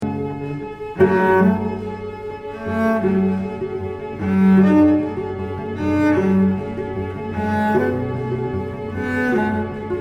Cello

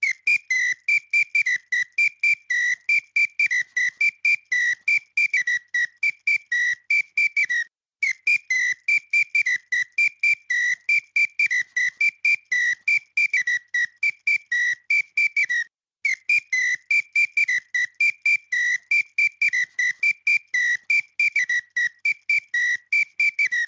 Batucada Whistle

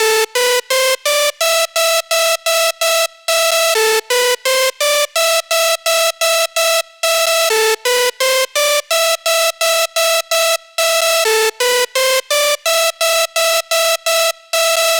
Melody

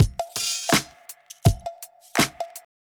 4 Beat 1 Bar loop

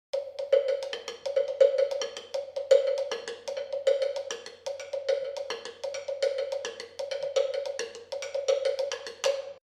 Temple blocks

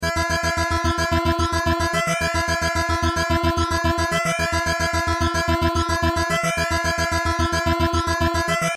synth bass

